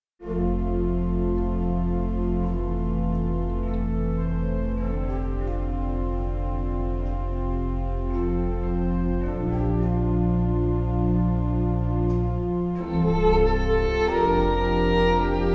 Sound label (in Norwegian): fiolin